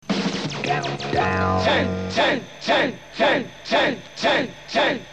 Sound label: Countdown Jingles